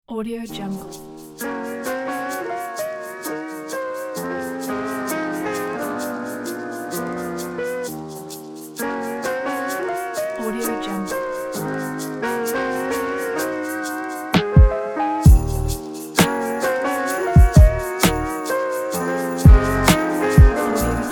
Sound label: relaxing jazz lofi beat